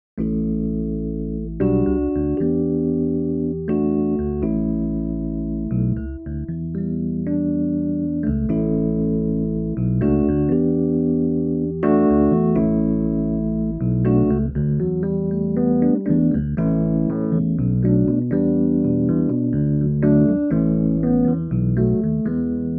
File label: tremolo